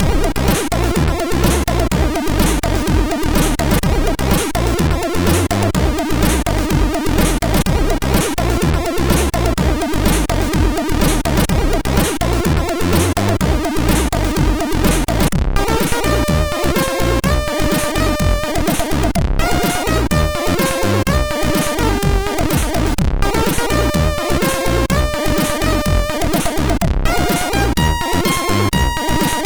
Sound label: Funk, sid MOS6581R2; Link Funk